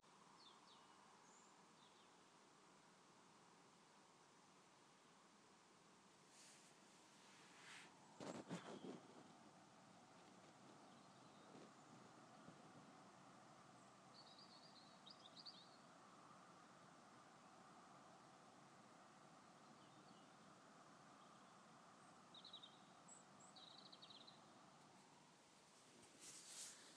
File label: sound of birds